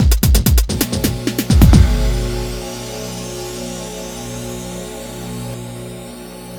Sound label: klamm bpm130, drumloops